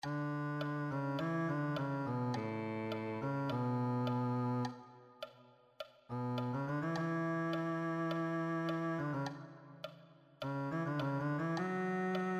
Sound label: cello